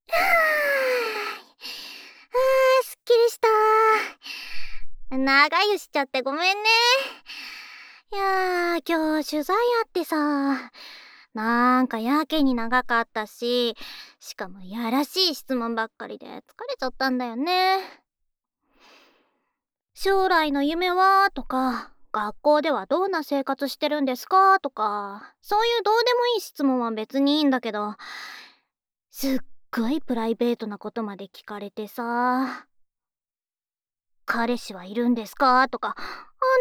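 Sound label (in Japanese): ペロペロ（フェラチオ）, ASMR; 気持ちいいところ、ペロペロしちゃうから♪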